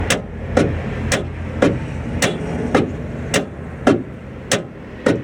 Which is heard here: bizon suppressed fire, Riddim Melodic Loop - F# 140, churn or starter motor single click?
starter motor single click